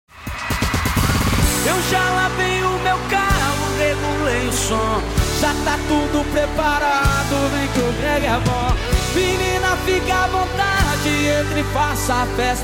balada

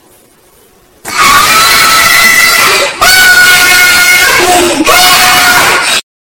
grito

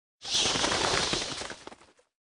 passaros voando

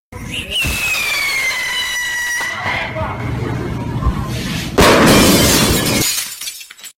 som estranho

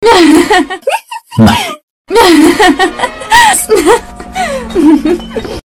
fazenda maluca risos

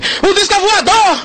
disco avoador

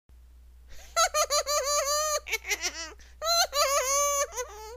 risadinha

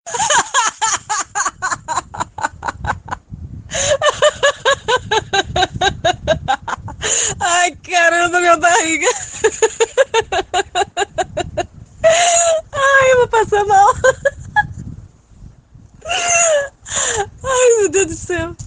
risada

